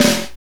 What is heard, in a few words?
snare R B, Roland snares; Northstar - Drumscapes Roland